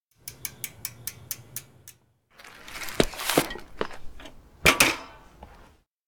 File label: bike brake stop; anemoi